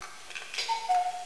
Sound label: enter